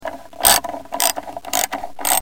Socket

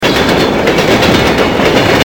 Train

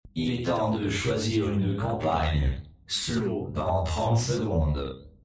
Jingle

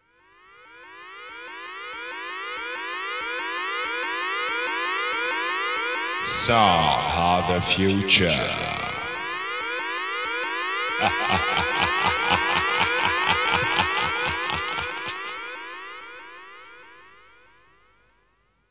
Jingle

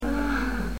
Humm

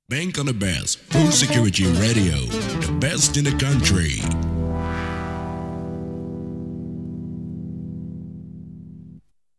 Jingle

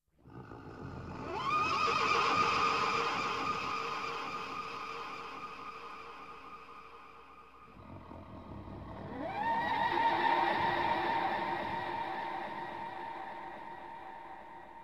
Jingle

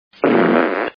Flatulence

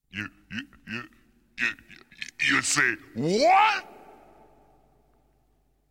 Jingle

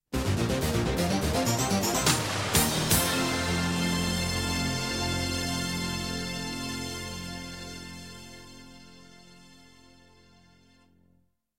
Jingle

